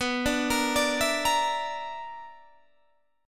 B-Minor Major 7th Double Flat 5th, Guitar Standard; BmM7bb5 arpeggiated; JGuitar